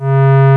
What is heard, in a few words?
C 3 SINE, ARP, OLD SYNTHS; Rubber Chicken Software Sample Bank